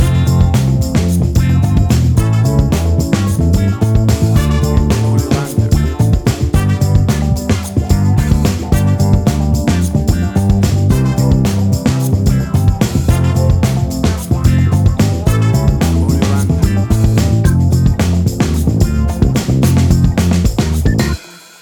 Acid Jazz Fusion

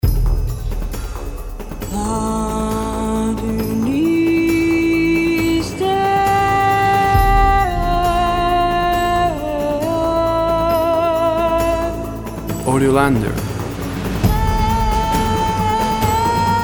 Big Drums

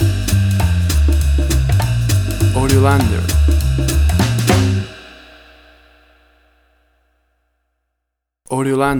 60s Acid Jazz Music Stinger

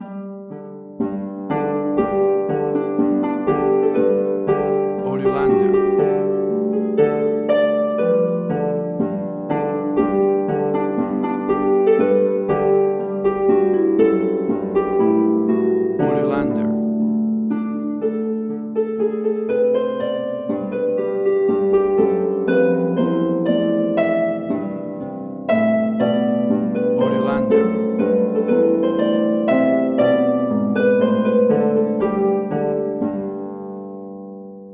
Albania National Anthem Harp

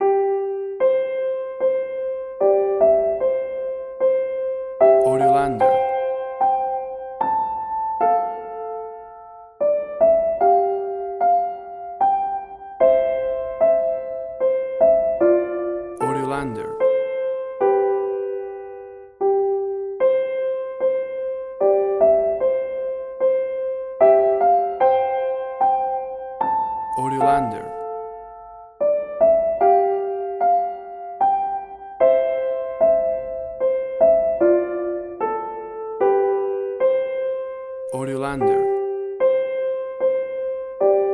Away In A Manger Soft Piano

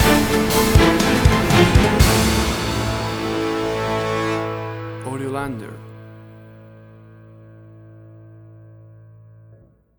Big Epic Music Stinger

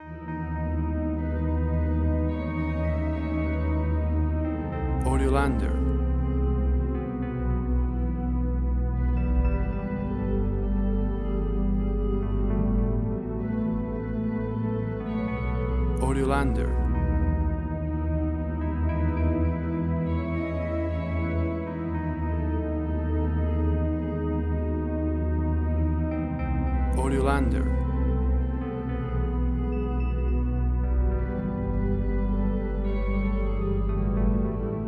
Baa Baa Black Sheep Horror

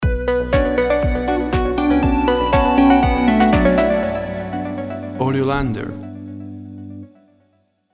Tropical Chilled Electro House Stinger

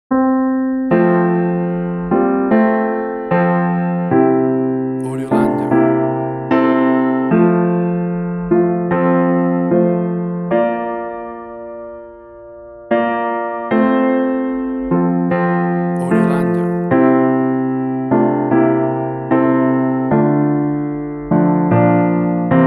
Auld Lang Syne Soft Piano

